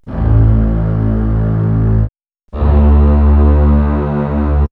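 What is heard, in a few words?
string, orchestral strings; X-Static Goldmine; backtothe70's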